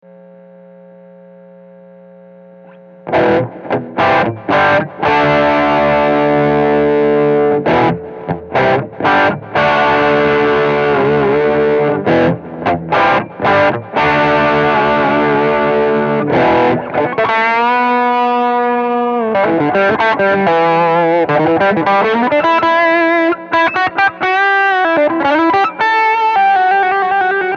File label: Pick Ups; Epi Casino